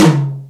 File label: Tom High, Akai Mpc60; Drums